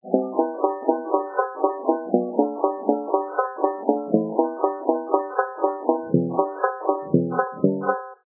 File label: sticks